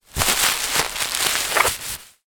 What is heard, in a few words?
plastic bin trash liner scrunched up handling; foley; zapsplat